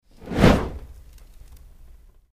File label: Flame Whoosh Swift, fireballs